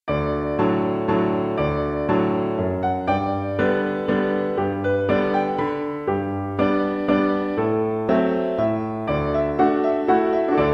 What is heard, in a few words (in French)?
Valse des Pizz Violon et Piano